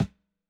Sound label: SNARE CENTER; JUNGLE BEATS; ELECTRO KIT